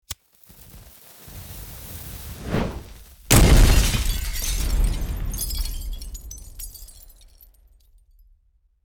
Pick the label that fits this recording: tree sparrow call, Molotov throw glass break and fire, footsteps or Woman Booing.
Molotov throw glass break and fire